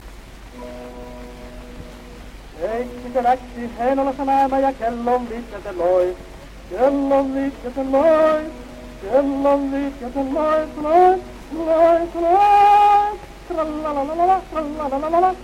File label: Gramophone